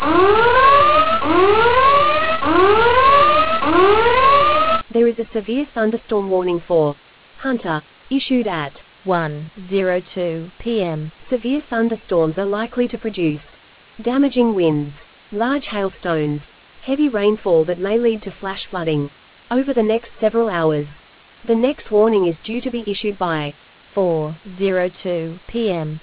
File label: storm audio